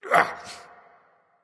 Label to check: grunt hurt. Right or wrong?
Right